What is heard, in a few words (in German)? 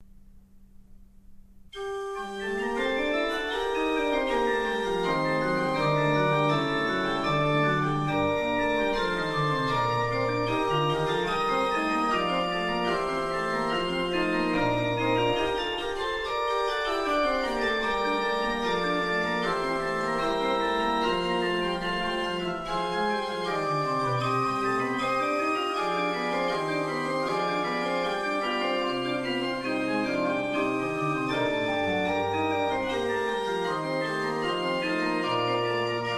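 Godefroy Hartmann 17 II P, pfeifenorgeln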